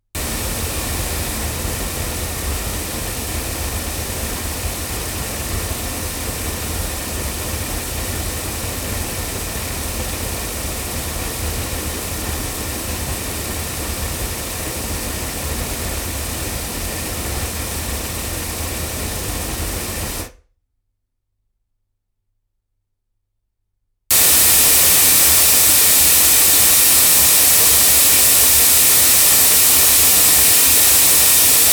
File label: cardioid loudspeakers